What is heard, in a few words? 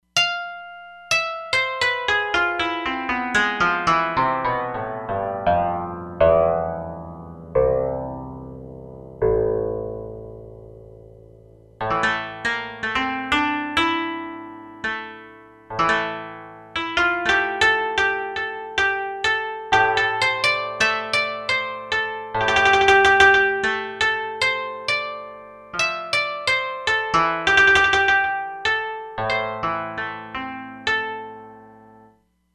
Koto, E-mu Proteus-3; Factory patches